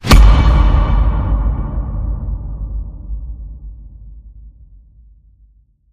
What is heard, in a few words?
Whoosh Hit